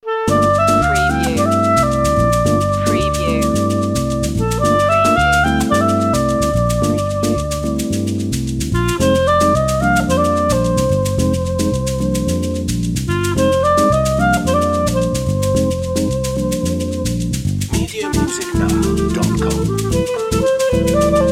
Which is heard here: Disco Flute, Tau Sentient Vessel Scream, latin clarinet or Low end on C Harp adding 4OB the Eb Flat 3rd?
latin clarinet